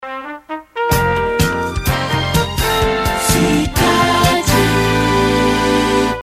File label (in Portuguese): Vinheta; Cidade FM